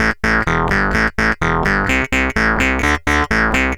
A 127, Synth Loops 127bpm; Zero-G; Soundclash Nu Dance Electro; Acidized

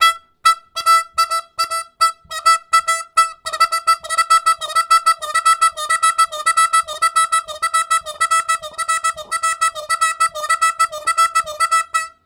High Spot, Harmonica Riffs; Zero G

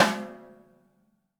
DW Green Maple Timbali; Sonic Foundry (Sony Creative Software); Vital Drums

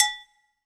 AGOGO LOW, HR-16 Drum Mashines; EdgeSounds

